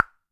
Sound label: Tock, Kitchen